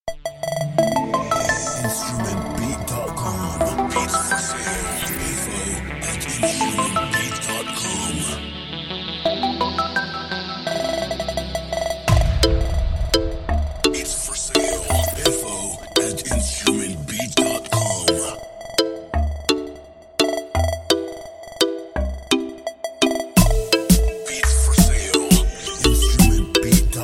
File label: Beat Reggaeton